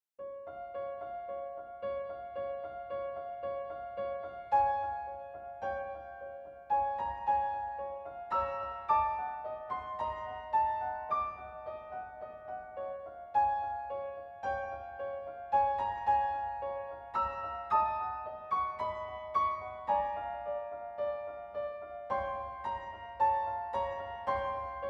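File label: greeting, organist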